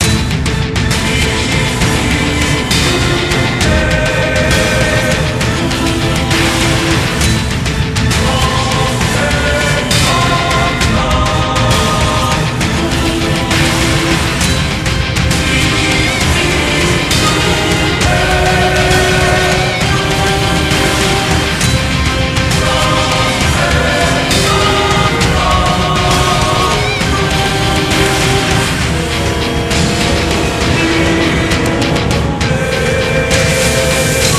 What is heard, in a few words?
Thunder Of God; bellatia